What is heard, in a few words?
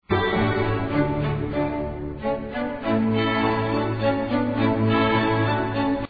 minuet